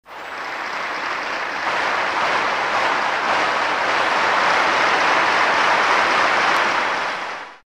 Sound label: crowd